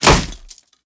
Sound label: Vapor Miner Box Hit